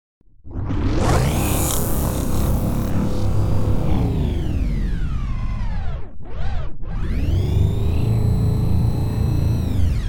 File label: Moving Machine Sequence